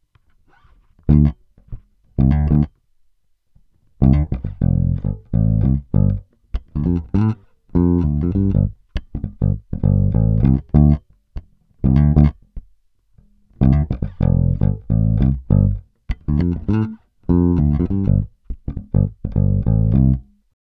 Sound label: Both Fingerstyle Tone UP; peavey shootout audio samples